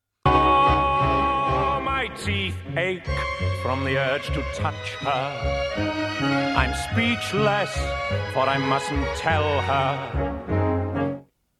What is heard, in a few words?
countermelody